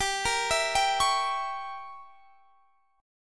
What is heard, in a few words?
G Diminished 7th, Guitar, Standard; arpeggiated; capo 2; x,22,20,21,20,21